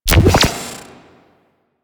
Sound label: Note Trigger Good Sound; Warframe; BardQuest; Bard Boss Fight Notes